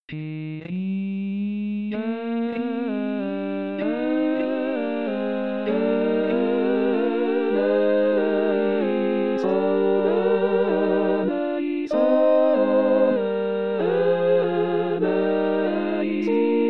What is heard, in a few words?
Missa De Angelis Kyrie Coro; Bartolucci; messe